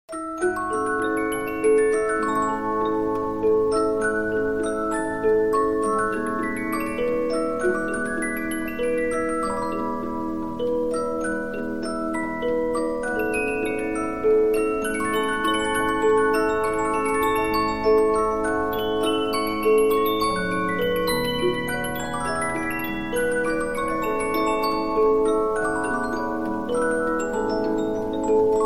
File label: Polyphon, musicbox 15inch